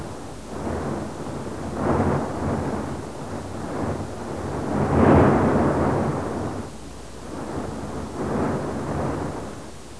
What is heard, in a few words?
jupiter breathing 25mhz